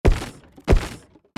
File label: Walk